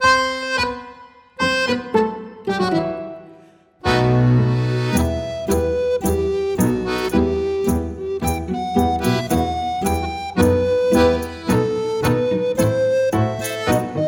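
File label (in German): Tango flautissimo, Flötentöne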